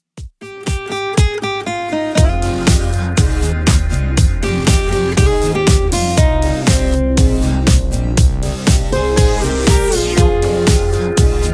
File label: Redrum 120bpm